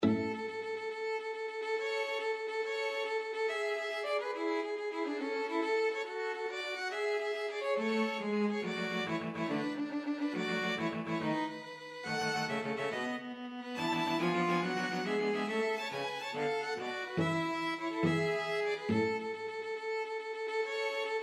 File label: italian, string quartet; mendelssohn